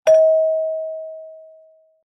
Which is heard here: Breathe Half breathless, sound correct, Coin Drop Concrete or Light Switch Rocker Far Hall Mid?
sound correct